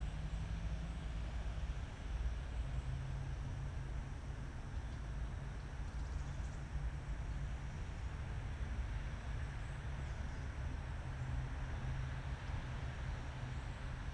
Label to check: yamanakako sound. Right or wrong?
Right